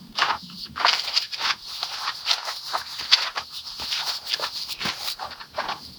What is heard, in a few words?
the evening air was cool, jogging